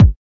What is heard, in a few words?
kik